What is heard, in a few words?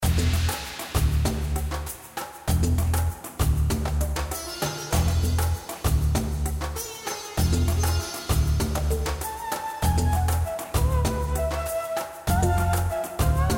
Epic Desert Theme; Trouserheart; Android